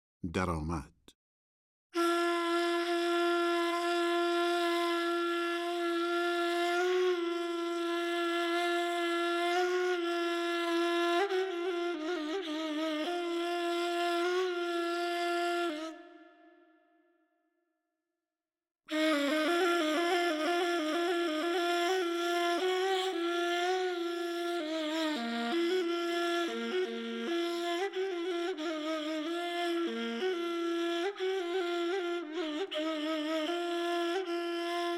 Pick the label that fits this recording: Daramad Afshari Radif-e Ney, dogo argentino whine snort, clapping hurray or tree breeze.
Daramad Afshari Radif-e Ney